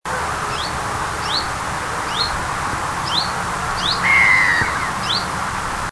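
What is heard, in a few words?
Phylloscopus collybita, Hannover; 19.8.1998 Hannover, Niedersachsen, Germany; Linnut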